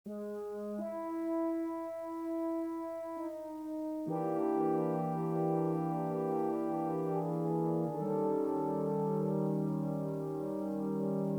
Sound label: trist chord wagner